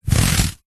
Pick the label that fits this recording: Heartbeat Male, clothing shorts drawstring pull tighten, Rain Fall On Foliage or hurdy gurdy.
clothing shorts drawstring pull tighten